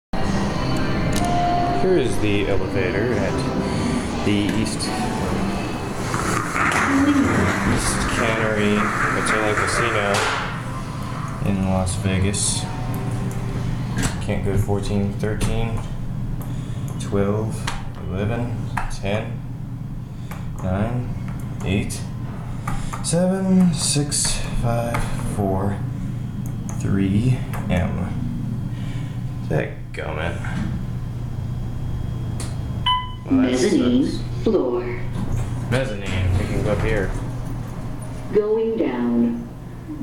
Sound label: Otis Gen2 traction elevator; otis elevator company